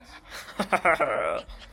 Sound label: laugh